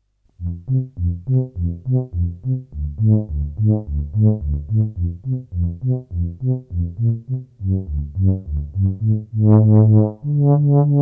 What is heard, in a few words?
Tuba